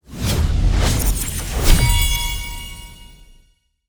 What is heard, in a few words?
settlement high